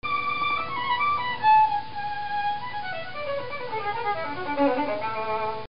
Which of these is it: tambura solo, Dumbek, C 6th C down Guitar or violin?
violin